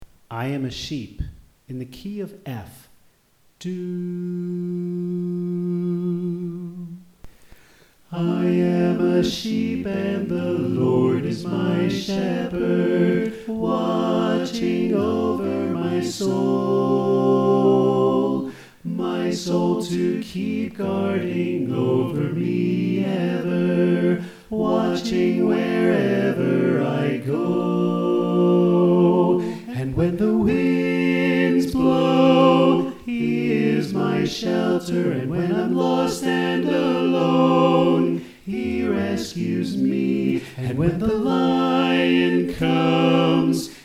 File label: Soprano Left